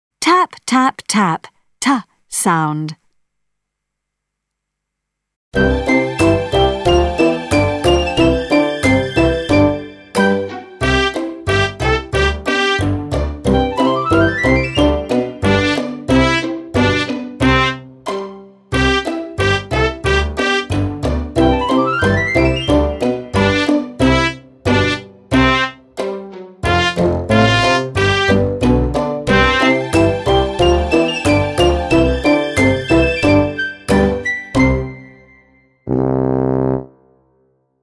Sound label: tap tap tap